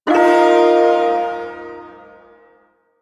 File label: Ersatz Shawzin Pent Min Chord; Warframe; Duviri Ersatz Shawzin